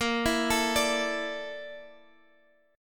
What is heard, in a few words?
A sharp Major 7th Flat 5th, Guitar Drop D